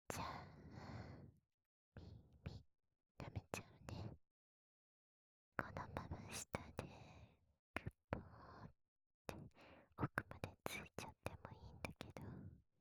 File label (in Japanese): 軽い耳舐めと奥ぐちゅ耳舐め; 効果音なし・左右反転版